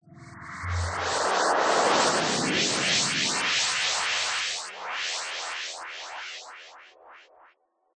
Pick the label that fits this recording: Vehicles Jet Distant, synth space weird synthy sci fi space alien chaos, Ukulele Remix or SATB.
synth space weird synthy sci fi space alien chaos